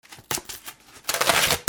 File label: RIPPING